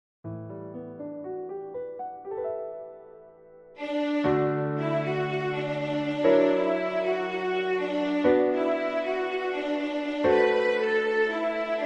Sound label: Piano